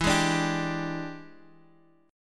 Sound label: E 7th Sharp 9th E down Bass Standard; strummed; chord